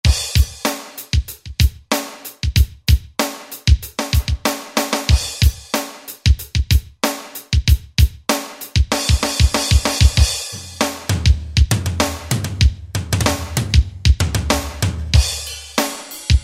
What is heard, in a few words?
Power Drum Set, Roland JV-2080; Rhythm